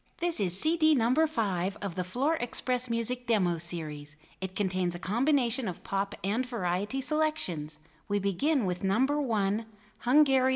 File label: Hungarian Rock